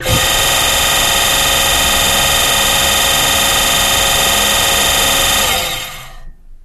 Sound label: Jet Boat Water Pump Th, boat thrust